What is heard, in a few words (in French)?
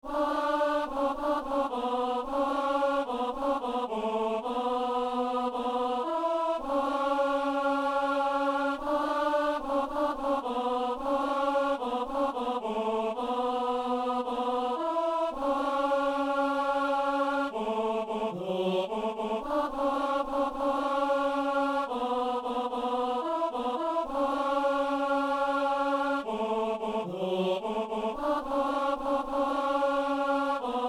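alleluia psaume, ténor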